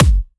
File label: normal clap